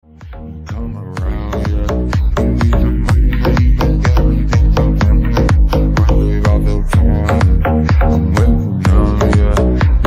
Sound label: remix instrumental